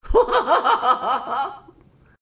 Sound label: laugh